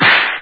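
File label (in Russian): громкий звук удара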